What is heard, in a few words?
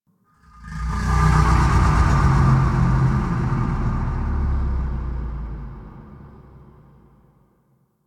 Moan Exterior, Ambience